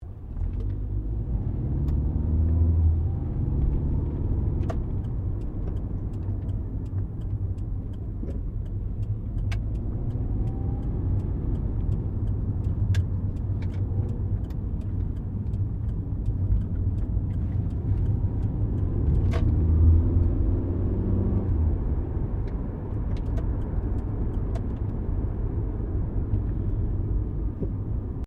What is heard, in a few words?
CAR SUBARU LIBERTY INTERIOR DRIVING SLOWLY TURNING